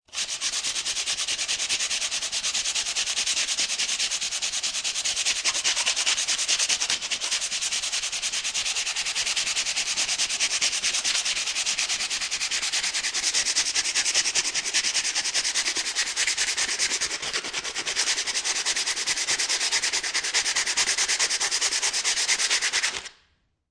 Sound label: Sand Paper Sanding Wood Fast, Industry, Sand Paper, Sanding Wood Surface, Fast; Sanders